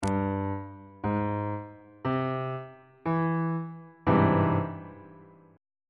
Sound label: a flat augmented major 7th 3rd inversion